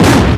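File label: Battle Skill; Etrian Odyssey III HD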